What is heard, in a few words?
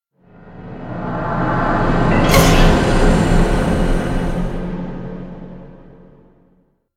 Stinger Swell 5 Seconds